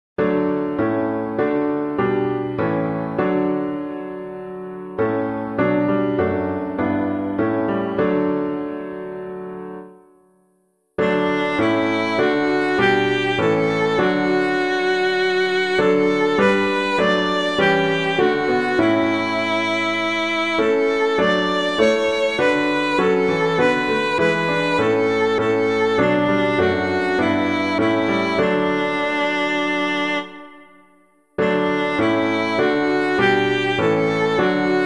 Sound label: piano, hymn; Blest Are the Pure in Heart; Keble; FRANCONIA